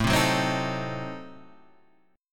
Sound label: A Diminished 7th A, Guitar Standard; Adim7 strummed; JGuitar